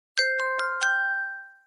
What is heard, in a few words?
Bell Tones; Nerdist